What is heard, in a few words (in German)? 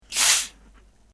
Kolaflasche oeffnen